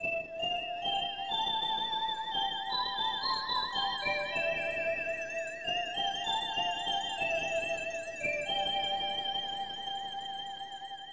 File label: Ocarina